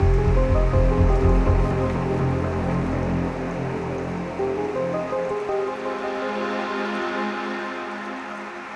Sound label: Arp Loop; BB 2HTC Samples Vol 4